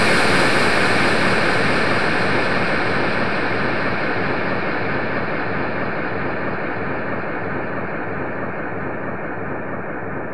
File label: water; Sonic Foundry (Sony Creative Software); Ugly Remnants VOL-2; UR 2 FX (Long)